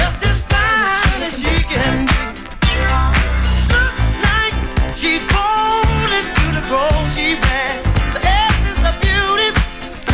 disco

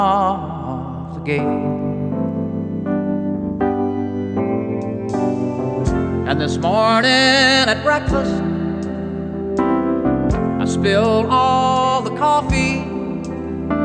country

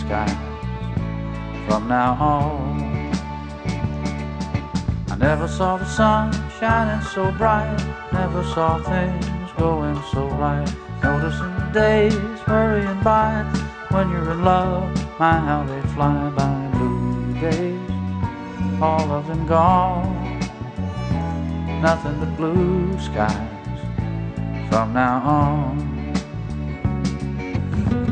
country

